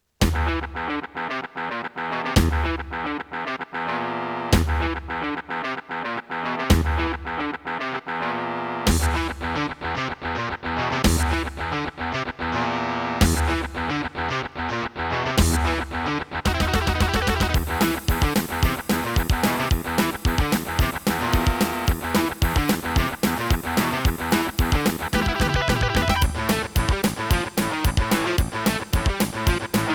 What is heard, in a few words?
Roland CM-500; Doom